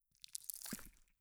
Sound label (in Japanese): 抜く音; 効果音